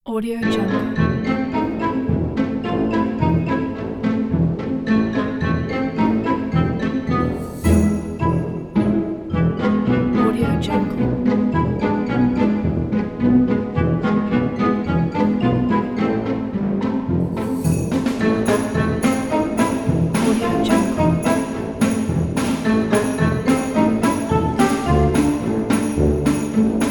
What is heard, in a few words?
comedy music